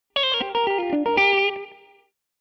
chicken picking

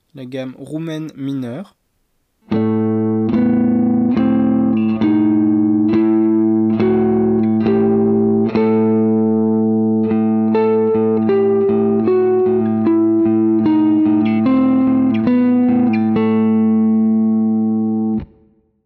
single String romanian

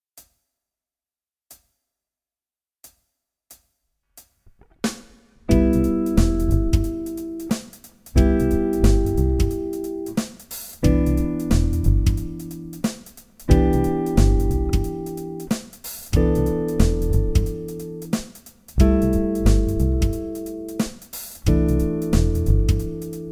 voicings CMaj7

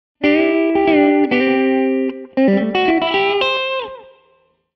chicken picking

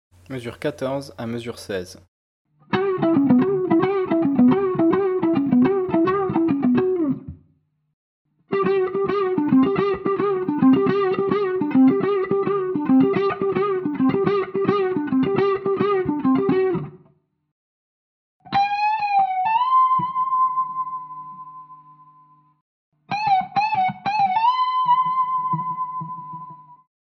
bend serie

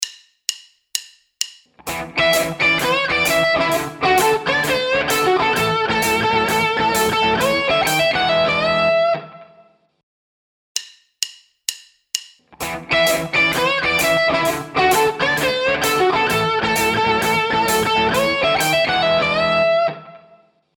british lead

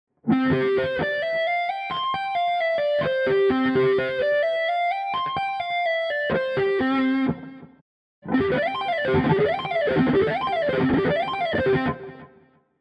lick; godin

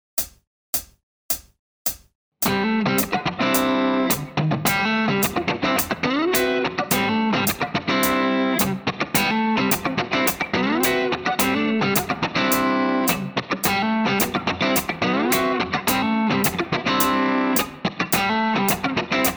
lick

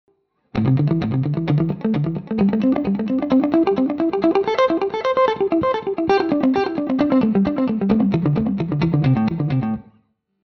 delay

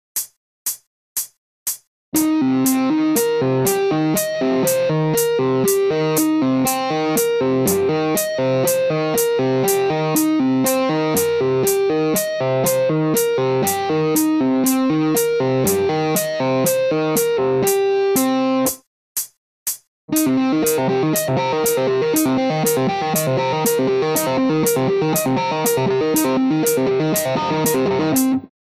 tapped Harmonics

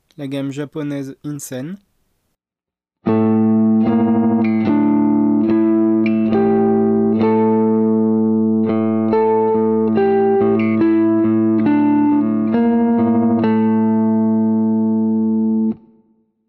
single String insen

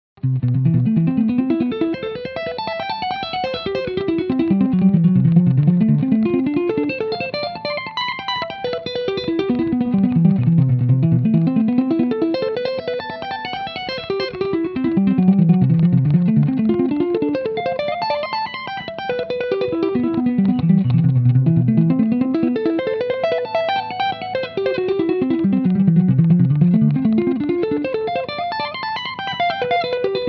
octave Delay

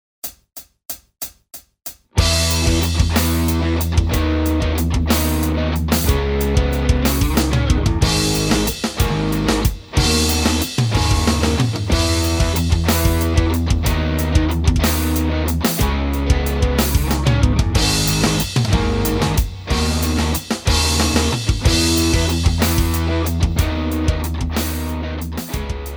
power chords

